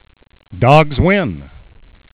dogs win; World Championship Checkers; WCC Silver

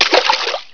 splash; FishSim

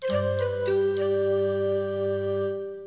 Default Alarm; PC World 2004-11

